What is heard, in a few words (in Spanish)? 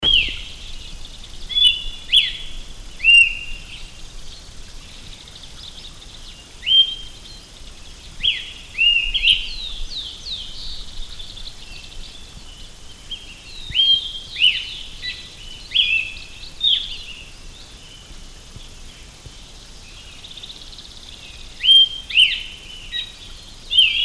Zorzal Patagonico, sonidos de la patagonia